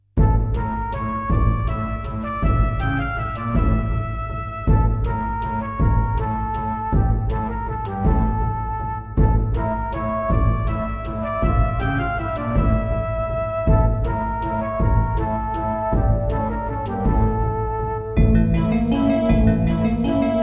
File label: Terratec MiniWaveSystem WT64